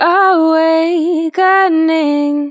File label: "Ooh way", Vocals, Chants